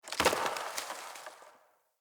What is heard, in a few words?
Wetland Forest Day Branch Break; Midrath Island; Ambience